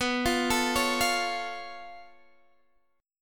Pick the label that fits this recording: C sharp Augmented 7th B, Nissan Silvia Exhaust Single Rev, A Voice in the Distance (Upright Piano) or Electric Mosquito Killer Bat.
C sharp Augmented 7th B